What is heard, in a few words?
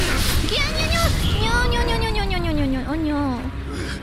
nyo noises